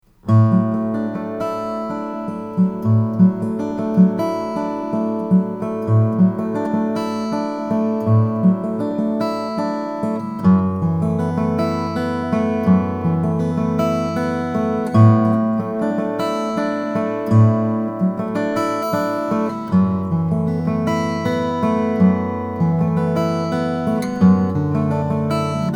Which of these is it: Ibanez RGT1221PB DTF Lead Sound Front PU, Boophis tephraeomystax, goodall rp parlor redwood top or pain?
goodall rp parlor redwood top